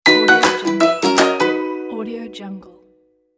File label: kids logo